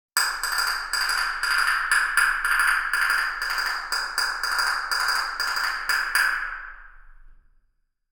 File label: castanets Rev dynamic, ambisonic; A0 A360 E30; HOA; binaural; Reference (HOA)